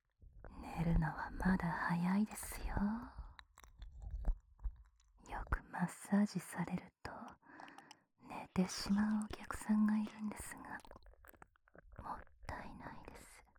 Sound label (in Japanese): 耳舐めされながら、乳首マッサージ・ディープキス・乳首舐め・手コキ・フェラされる音声; 27分間エステの悪〜いどすけべお姉さんに耳舐めされながら、乳首マッサージ・ディープキス・乳首舐め・手コキ・フェラされる音声